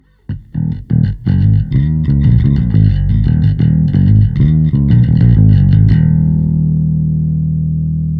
Bass Beefy Modern Bass; Neutron 4

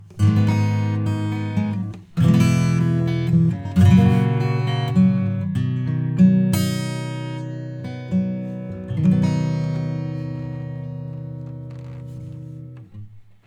Acoustic Guitar; Neutron 4

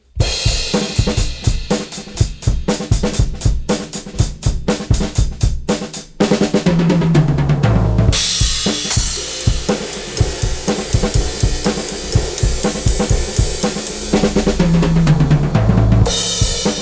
drums

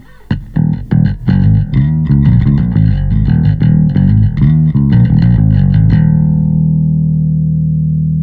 Compressor bass Bass Parallel

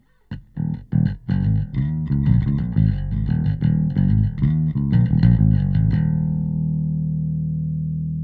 compressor bass; Titanium 3

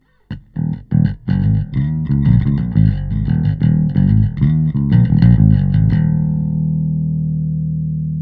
bass; PHYSION Mk II

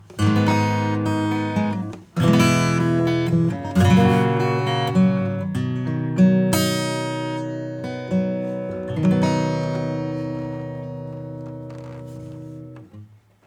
Acoustic; RED

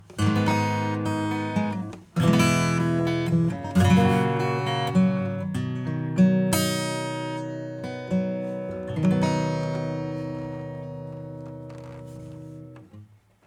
acoustic Clean Acoustic Mud; SplitEQ

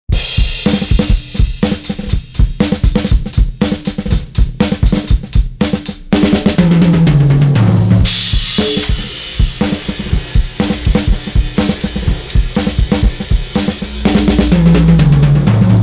drums Buss Heat; Nanopulse

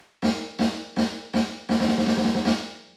Plate Reverb; EU Decay 0.9

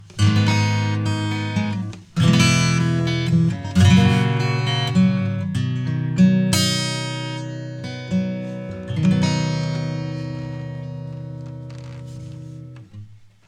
80 Series EQ High Boost 10KHz; Lindell

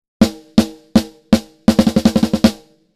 snare Tighten Snare; Neutron 4

